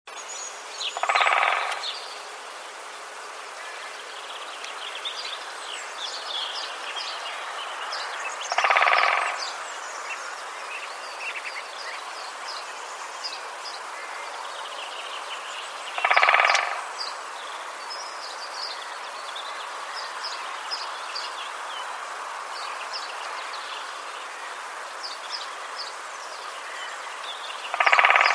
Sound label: Dendrocopos major